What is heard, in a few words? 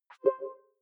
click big bubble high